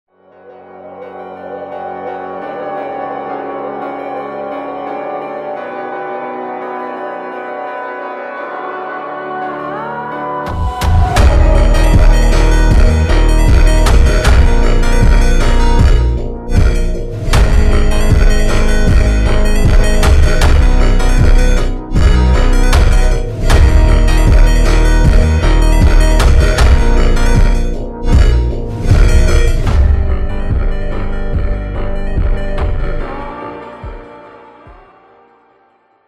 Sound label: Brazilian phonk